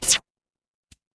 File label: retro game hit